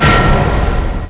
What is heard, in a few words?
chink; quakeworld; quakeforge; fortress